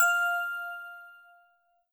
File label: bell